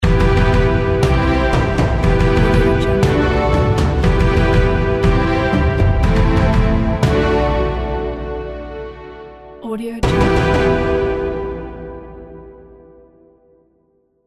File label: epic orchestra ident